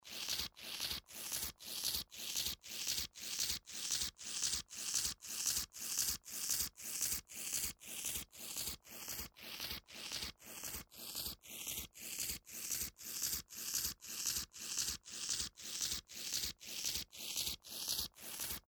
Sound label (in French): Papier, binaural